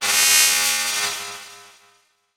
Transition Electrical Surge; Production Element Title Transition

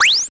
Beep Electronic; Multimedia Internet CD-Rom Flash

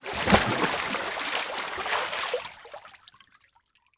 Liquid Water Water Splash

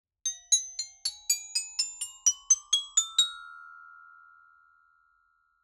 Xylophone Tip toe, Slapstick Comedy; Coll Anderson; GDC Game Audio Bundle

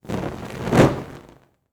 Fire Torch Movement Slow Pass By; Fire and Explosions

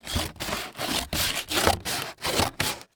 Industry Construction Paper Cut

